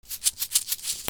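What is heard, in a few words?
percussion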